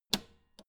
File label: old button hard press